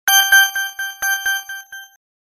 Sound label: rune